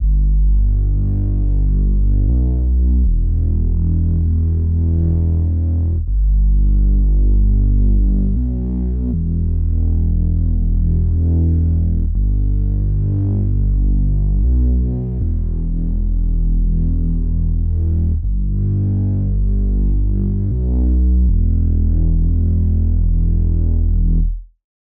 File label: 158 BPM B Min Bass; Melody; RnB; Loop Stems; Cymatics; 2022 Melody Collection; Without U